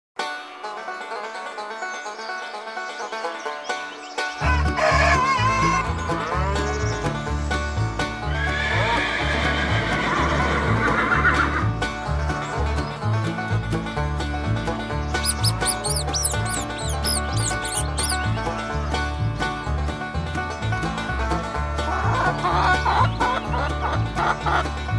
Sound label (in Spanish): SONIDOS DE ANIMALES DE LA GRANJA; PARA NIÑOS; Ambiente de la granja